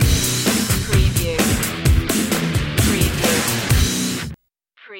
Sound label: rock box